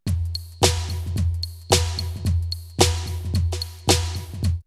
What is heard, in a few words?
R8, drumloops, DrumMachines; X-Static Goldmine; 90 s Sample CDs